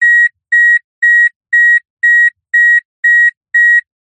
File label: transport car warning beeps keys left in ignition toyota corolla short